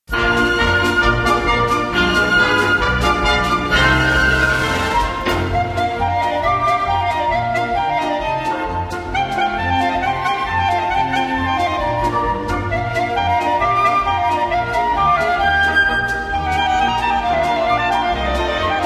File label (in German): Klarinettensolo